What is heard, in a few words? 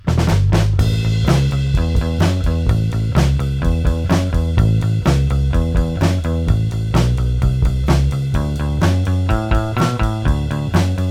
bass